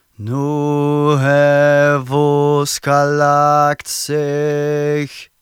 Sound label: Battle Cry, Viking Vocal, Un-Processed